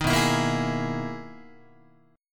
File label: B Major 13th, down, Guitar, BM13 chord